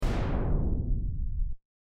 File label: noise eg